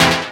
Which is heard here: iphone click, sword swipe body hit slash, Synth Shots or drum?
Synth Shots